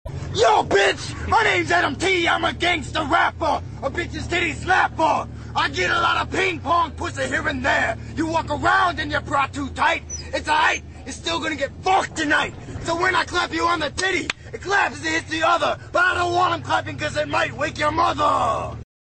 Is Calling Pop

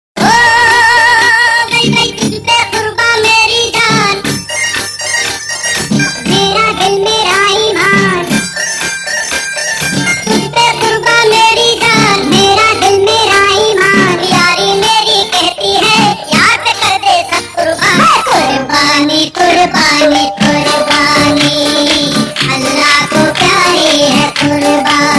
dj baby laugh; children

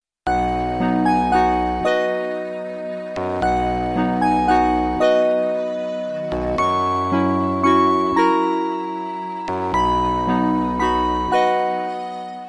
holly night ringtone

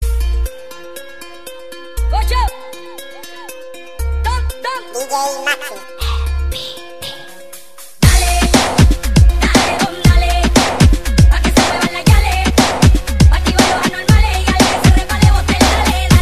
Crazy Music

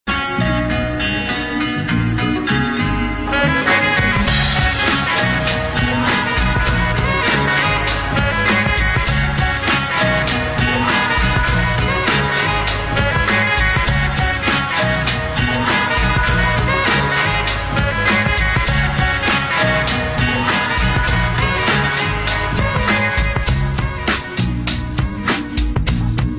fruity loops sample beat

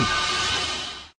msg alert tone; ringtones; sms

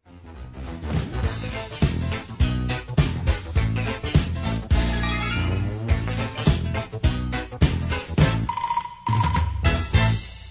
theme instrumental; inspector gadget

